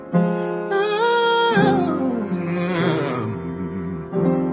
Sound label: low falsetto into low